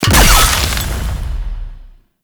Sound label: Sabotage Target Destroy; Warframe; Nokko Colony